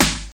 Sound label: classic original snare one shot g sharp key